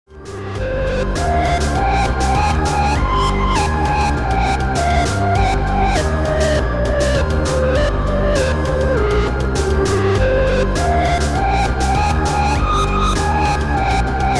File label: Sci-fi combat music